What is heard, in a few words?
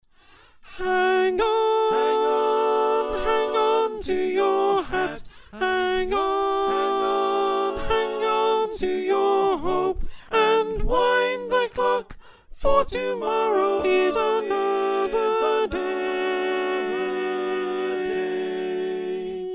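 Soprano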